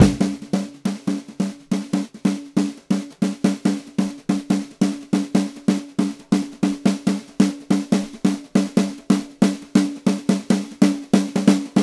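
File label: Country Drums Breakdown; Digidesign; Pro Tools Essential Loops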